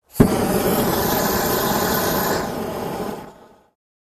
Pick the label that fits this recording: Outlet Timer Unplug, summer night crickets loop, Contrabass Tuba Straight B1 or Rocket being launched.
Rocket being launched